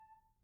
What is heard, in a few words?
Grand Piano Main Release A4, Kawai; Legacy